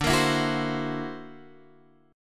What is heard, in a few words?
D Augmented 9th down Guitar Open G